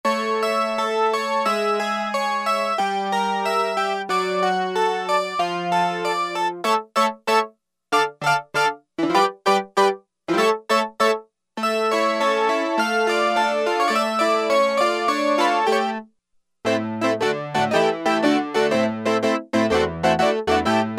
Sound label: Harpsichord 1, Roland Juno-60; Factory presets